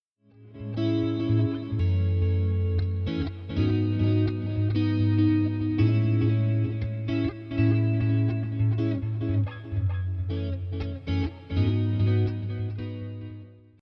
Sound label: Fusion Clean